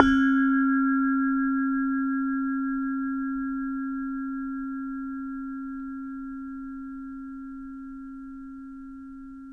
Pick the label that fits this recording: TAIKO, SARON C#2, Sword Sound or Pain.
SARON C#2